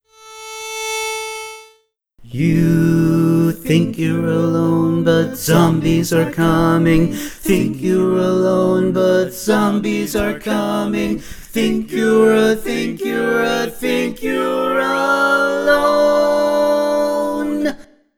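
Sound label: Baritone, barbershop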